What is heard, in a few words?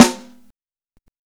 Snare Live, Drum and Bass; EZ Rollers; Instrument Samples